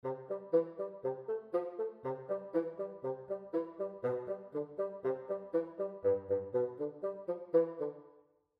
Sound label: Bass Clef Example